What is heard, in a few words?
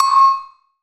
Perc, Gated Reverb; musicradar